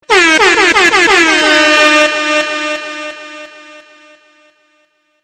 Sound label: Air Horn Super Mix Sounds Musical Car Horn